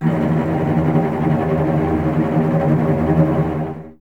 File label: D#2, String Ensembles Cellos Tremolo; Miroslav Vitous